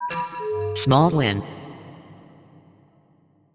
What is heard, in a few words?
win Small; PIXIGSLOT; netent